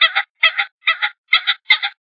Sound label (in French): Faisan cri